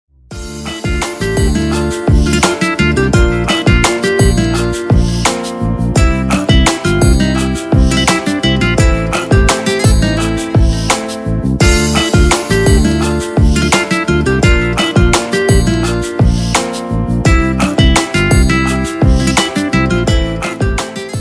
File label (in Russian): neljubov instrumental